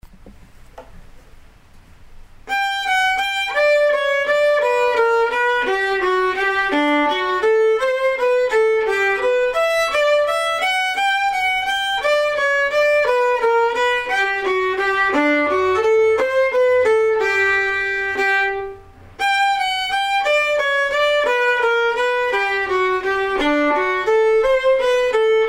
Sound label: slow, fiddle